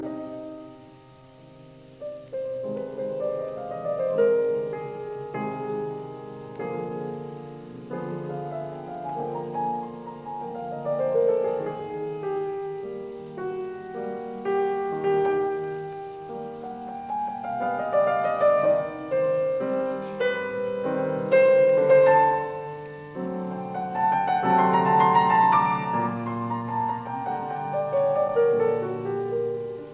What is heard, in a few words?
Variations in C major on a Waltz; 33 Variations; Op 120; Variation 31; Largo